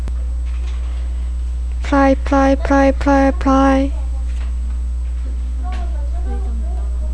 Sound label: fry